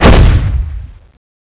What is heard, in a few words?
zombie bomb jump; CSO LightZombie